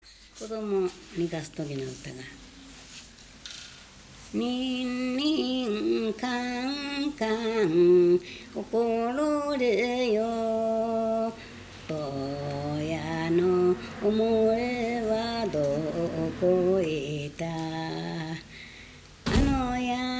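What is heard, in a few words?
lullaby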